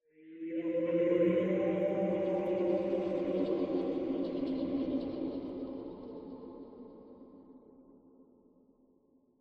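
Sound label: random laughs; ambient; Half Life Alyx; xen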